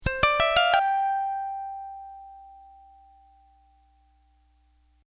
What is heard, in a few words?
glockenspiel kulele ukulele ringtone; Papagenos